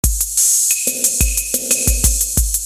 808 with reverbed woodblock, drumloops; esoteric

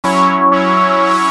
lush swoosh; esoteric

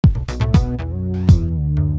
funked groove, basslines; esoteric

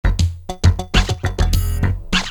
8-bit rez gnarly funk; esoteric

